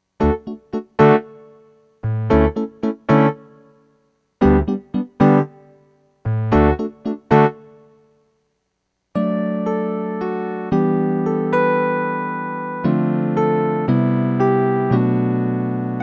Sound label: MPK Mini EPiano